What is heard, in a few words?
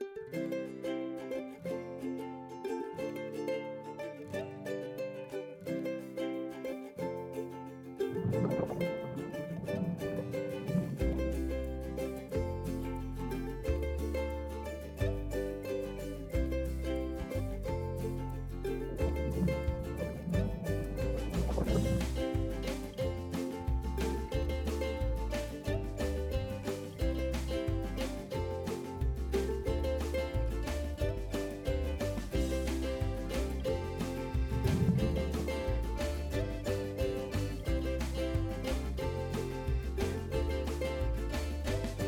music cheerful; bgm